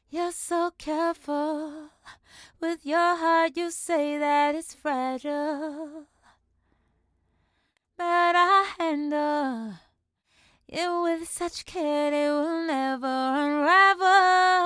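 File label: pop rnb, 122, A minor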